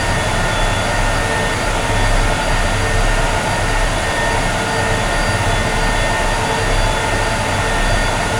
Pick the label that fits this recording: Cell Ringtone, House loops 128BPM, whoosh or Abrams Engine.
Abrams Engine